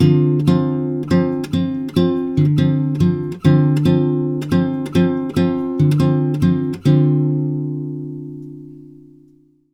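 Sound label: 140 guitar D minor 7, Brazil Bossa; USB Soundscan; AKAI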